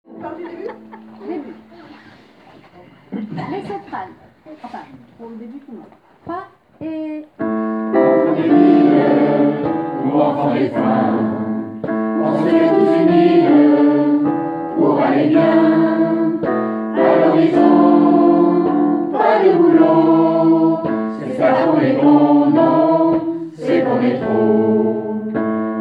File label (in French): Soprane